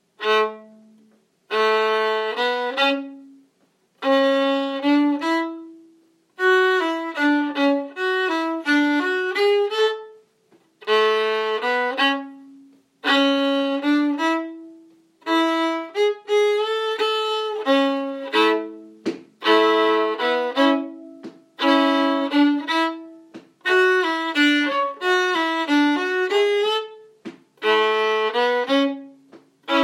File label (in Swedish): Clarin riff